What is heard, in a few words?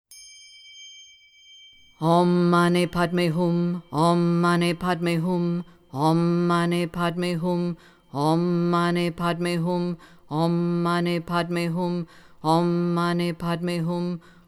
Om Mani Padme Hum